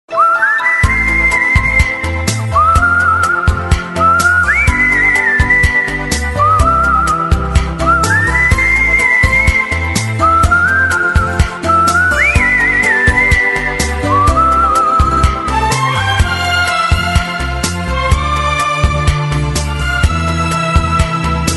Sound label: whistle romantic